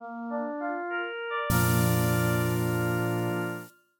victorious fanfare

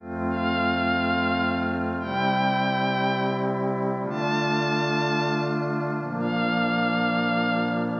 happy warm ensemble

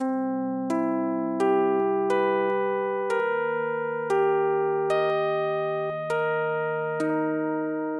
surprising modulation, music

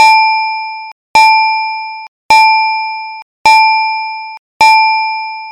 bell rings short

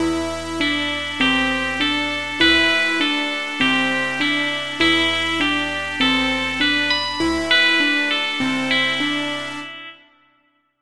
dark intro b dorian inflected em dark ancient

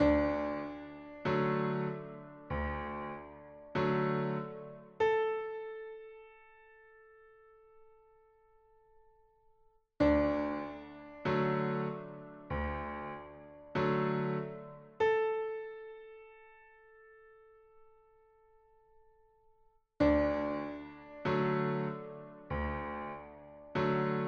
a open 5ths airy spacing

